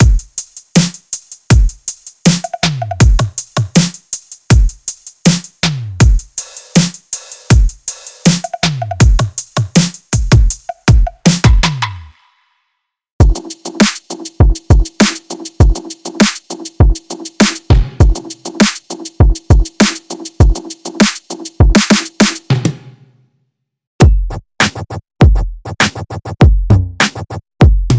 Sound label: Synthwave Synth Pop